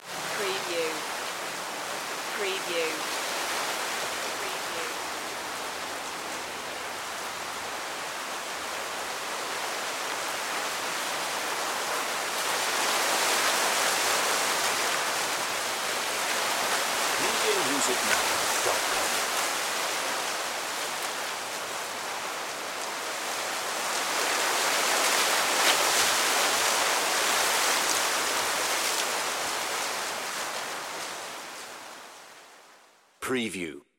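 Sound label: Wind Palm Trees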